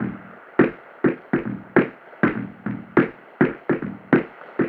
Drum Fx Loop, Electronica

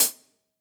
Acousti Hat Hit, hihat; Ultimate hihat samples

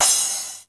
TR909 CRASH; East West - Global Sounds

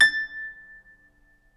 A5 CELTIC, HARP

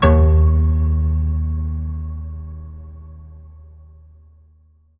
PIANO D#2; Best Service; Advanced Media Trax Modern Composer; PNO HAMON

